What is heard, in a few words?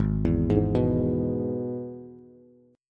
Ab Minor Sharp 5th, Bass; Abm#5 arpeggiated; JGuitar